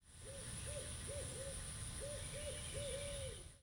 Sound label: Owl Audio